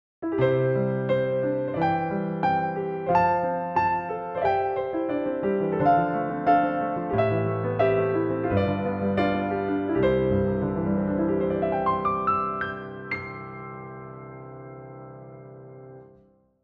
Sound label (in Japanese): 奏法例 アルペジオ系, piano